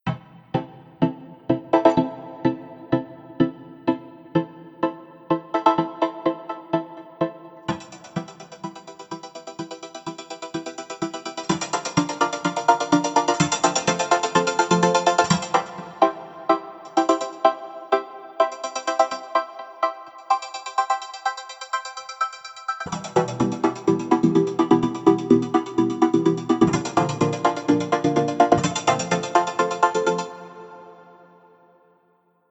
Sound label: Vocoder; MegaMod